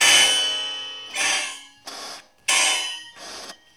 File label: shake loop; Rarefaction - Poke in The Ear With A Sharp Stick